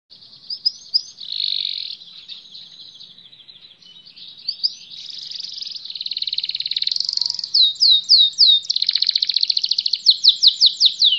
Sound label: Canary, Bird Songs